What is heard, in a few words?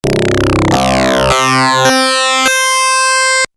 dx21 heavy synth A